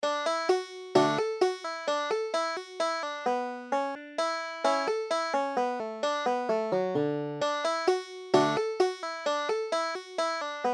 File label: banjo